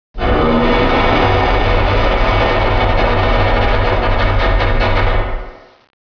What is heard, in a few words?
rebar pipe